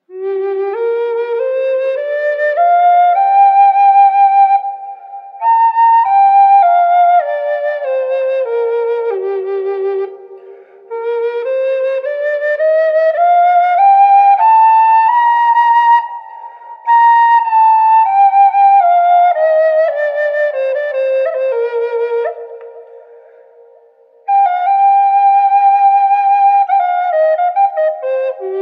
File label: Gm spalted maple wet, flutes; spalted maple burl